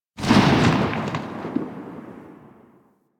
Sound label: Flame Spear Tail; Grineer